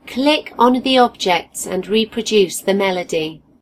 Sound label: melody; gcompris